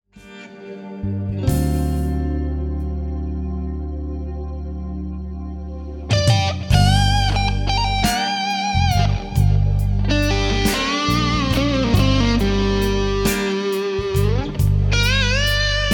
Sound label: Solo Long Delay EMG; Earth Drive